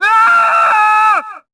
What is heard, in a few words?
aah